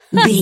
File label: voice female expression emote